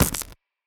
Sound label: Taser Shot